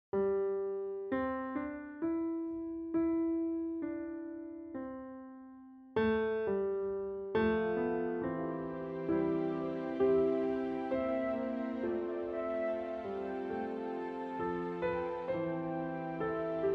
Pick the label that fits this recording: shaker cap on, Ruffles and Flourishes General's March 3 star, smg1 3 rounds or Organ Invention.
Organ Invention